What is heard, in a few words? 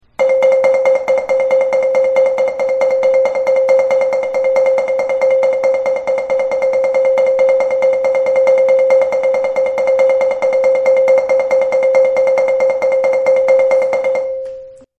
layering, marimba